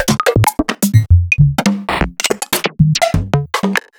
Percussions; Sliced

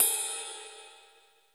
SY77 RIDE, drum; Crypton - DrumBase5000; SY77 DRUMS

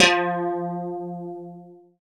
GUI CLEAN, E-Guitar; E-GUITARS

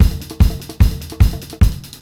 BEAT, Roots Disco; USB Soundscan vol.32; AKAI

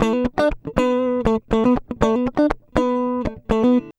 120 FUNKY, Acoustic Guitar Loops; USB Soundscan vol.04; AKAI

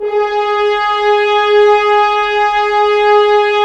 Horns; Roland - LA Composer vol. 4

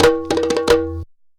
sabar groove; Best Service; World Colours

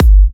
G, kicks; markus hakala kicks

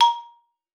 XPLA A#4, XYLOPHONE; Xsample VOL-4

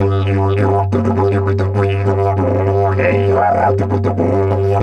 G 100, DIDGE 100BPM, Didgeridoo; Big Fish Audio - Didgeridoo and Other Primitive Instruments

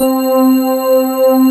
BELLS, JV 1080; Pro-Rec - Synthsonic

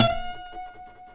G, Martin, guitars; Spectrasonics; Hans Zimmer Guitars VOL-1; MARTIN HAMMR

